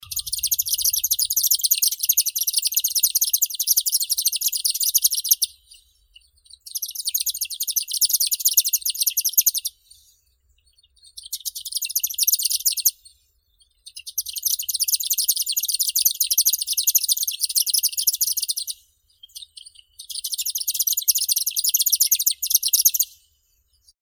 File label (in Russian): zvuki prirodi sverchok yaponskii locustella pryeri